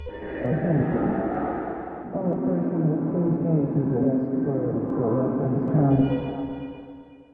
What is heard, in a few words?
kids